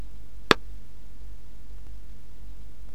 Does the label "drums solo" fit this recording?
No